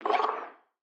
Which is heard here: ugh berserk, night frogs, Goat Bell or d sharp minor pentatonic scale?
night frogs